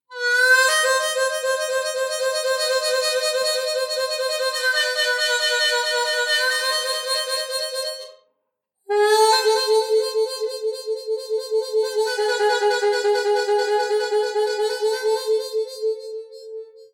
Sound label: standard trills